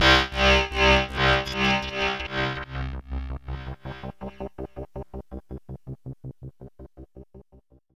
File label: FX, Dark Side Of TripHop